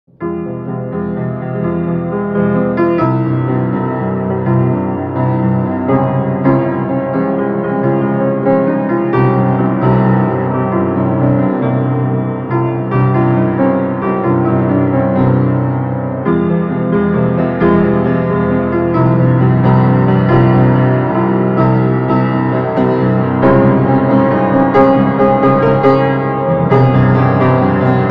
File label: piano meditation